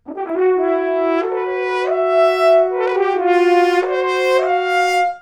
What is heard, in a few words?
french horn